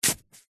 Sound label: garden wooden sharp gardening tool push force into grass soil single; household